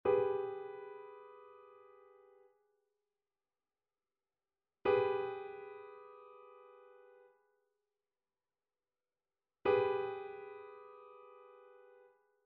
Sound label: trichord; trichord identification